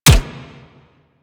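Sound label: machine gun shot; Weapons; Archwing Mach Gun B; Warframe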